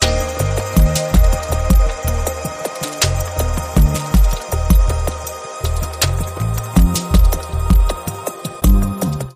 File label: Link Sting